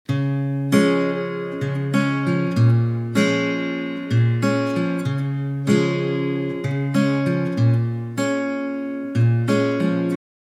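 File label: gtr before, guitar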